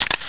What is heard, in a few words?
cam snap; LG; KH5200-Andro-1